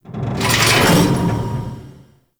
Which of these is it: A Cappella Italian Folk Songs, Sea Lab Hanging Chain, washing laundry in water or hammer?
Sea Lab Hanging Chain